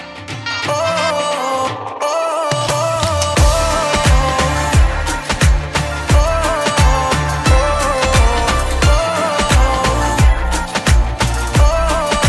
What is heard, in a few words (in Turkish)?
Zil Sesi Sozsuz